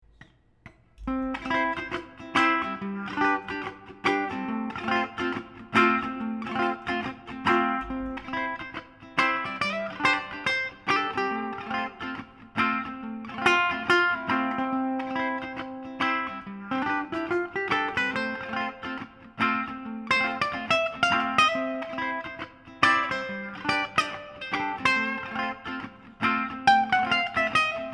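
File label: ukulele loop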